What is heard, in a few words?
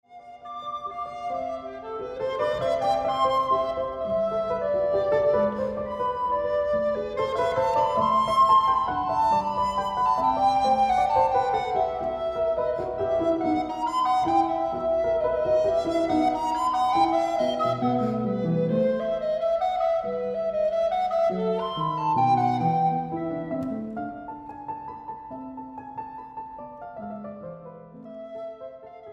Siciliano